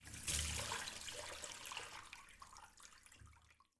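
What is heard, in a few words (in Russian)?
вода поток высокий; voda potok visokij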